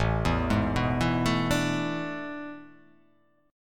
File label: A Major 7th Suspended 2nd Suspended 4th